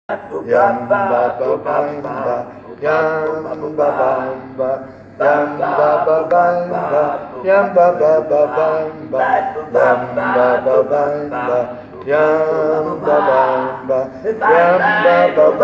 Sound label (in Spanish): Canción a tres voces